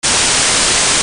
white stop 10kHz

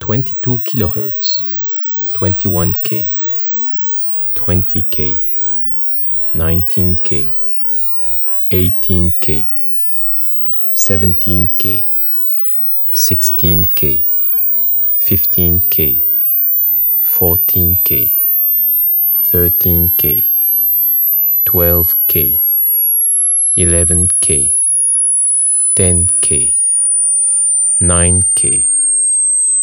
frequency check high; 48000

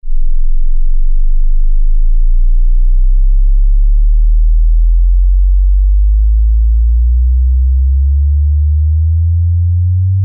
headphone shaker; Bass Shaker

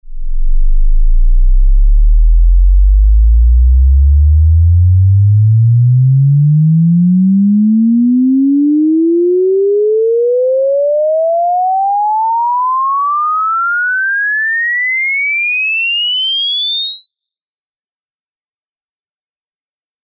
headphone sweep 20Hz-10kHz

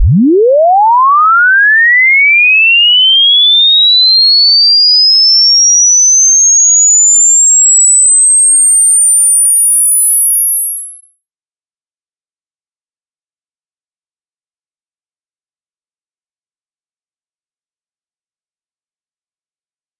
sweep 20-20k lin

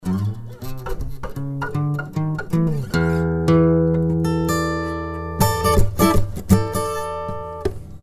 abspolarityinverted

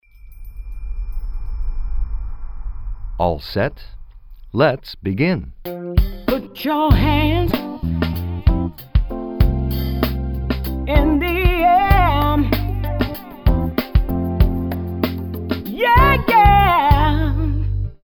eq stop 8000

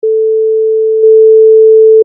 levelup 6db

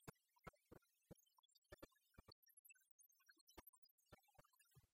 polarity guitar KO

